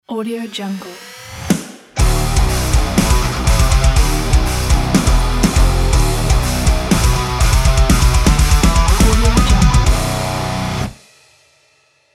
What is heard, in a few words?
hard rock opener logo; teslasounds